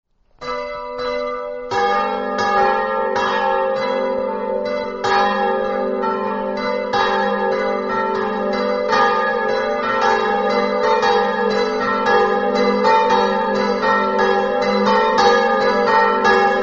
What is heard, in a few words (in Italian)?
cortale, campane